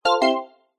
multimedia game sound positive warm bright bell mallet tone short